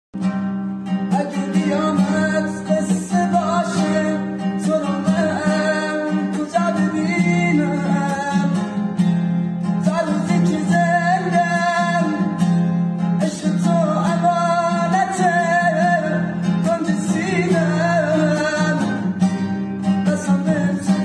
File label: Guitar Version